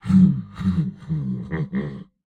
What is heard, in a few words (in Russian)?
Смех; Турбосвин